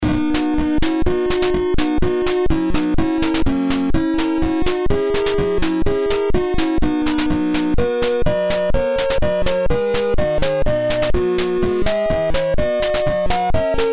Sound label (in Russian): zvuk bonusa v tetrise na dendi